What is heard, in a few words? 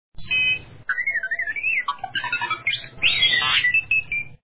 r2d2 tweet